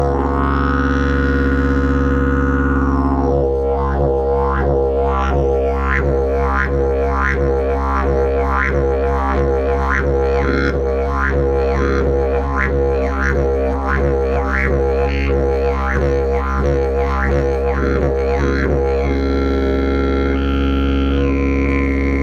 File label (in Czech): jilm, didgeridoo